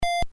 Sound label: beep